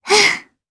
Vox sigh jp; voices; heroes; Frey; kings raid

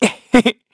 Vox Laugh; Evan; kingsraid

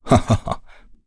Vox Laugh; Dakaris; kingsraid; voices heroes

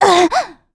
Vox Damage, kr; Valance; kingsraid; voices heroes

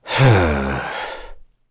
Vox Sigh; kingsraid; voices heroes en

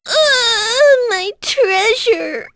Vox Dead; en; Miruru; kingsraid

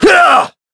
Vox Attack; Shakmeh; kingsraid

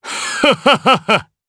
Vox Laugh jp; voices; heroes; DarkKasel; kings raid

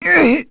Vox Damage; kingsraid; voices heroes; en

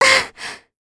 Vox Damage, kr; Talisha; kingsraid; voices heroes

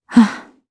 Sigh; Ripine; jp; kingsraid

